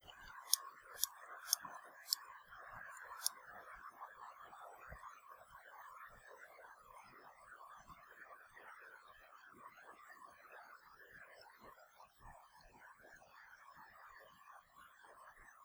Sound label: Stetophyma grossum; Schott